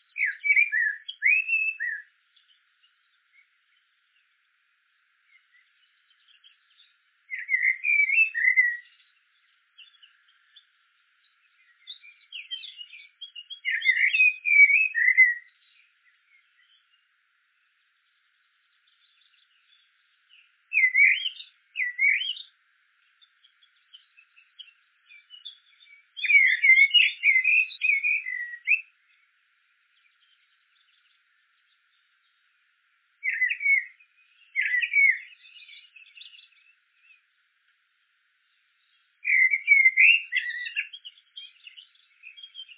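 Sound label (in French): merle noir, chants d'oiseaux; enregistrés par Jacques Prévost de la LPO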